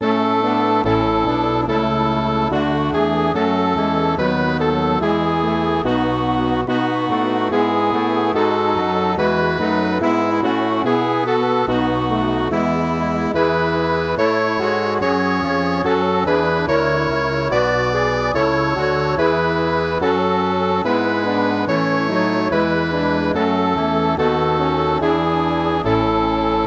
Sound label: MIDI